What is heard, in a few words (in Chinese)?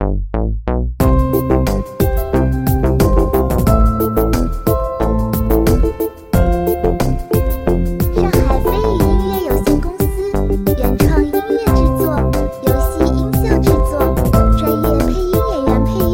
电子Q版欢快主界面; 童话大冒险; lobby